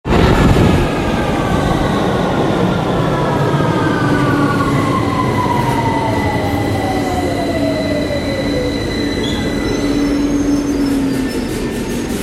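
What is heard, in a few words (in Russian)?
прибытие лондонского метро и открытие дверей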